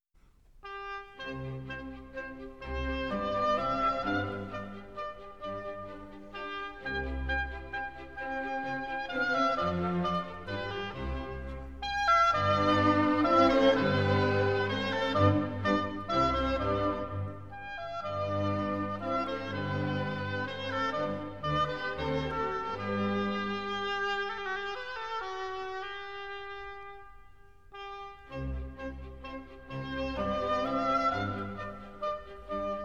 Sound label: Oboe Concerto C Major; third movement (Haydn); Legend of Galactic Heroes; Free Planets Alliance SIDE